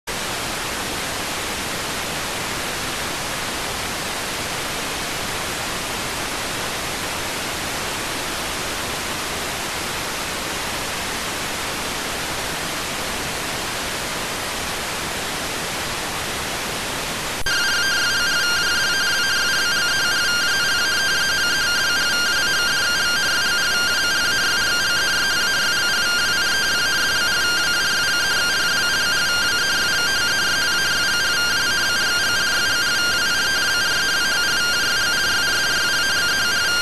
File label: rtty rx